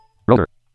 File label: Rotor Left; ESAN VO Sound Schemes; ESan Eloquence VO Sounds Pack